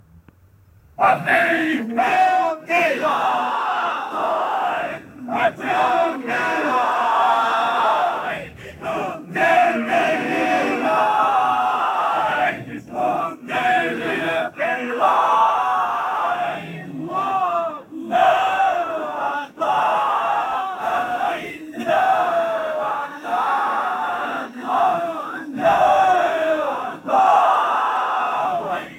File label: aggressive byzantine chan, chanting